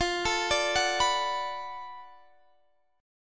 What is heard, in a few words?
D 6th F, chord; D6/F arpeggiated